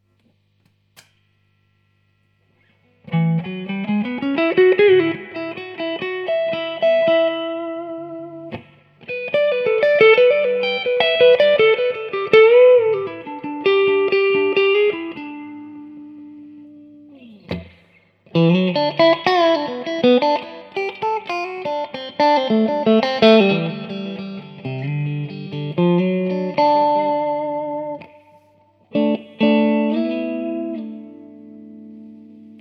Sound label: clean